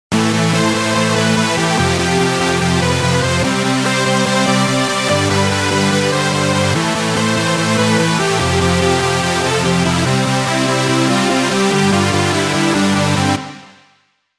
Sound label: Chord